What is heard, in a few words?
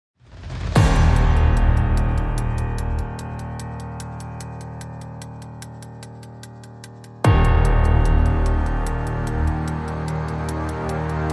No Drums No Strings